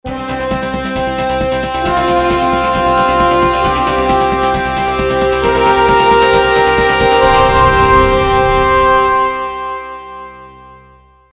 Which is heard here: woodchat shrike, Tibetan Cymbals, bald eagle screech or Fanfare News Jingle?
Fanfare News Jingle